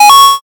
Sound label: coin